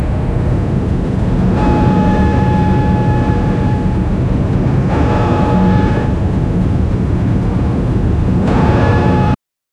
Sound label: sounds of industry until, industry